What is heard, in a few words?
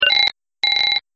Tom